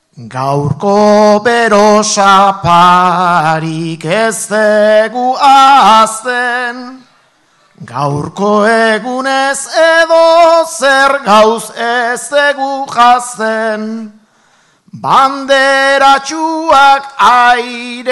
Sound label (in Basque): agurra, bertsoa; larraul